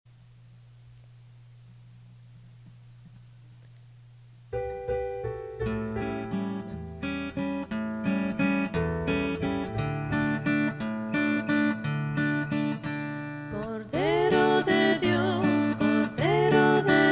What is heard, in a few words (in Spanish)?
Cordero de Dios, Spanish Mass Parts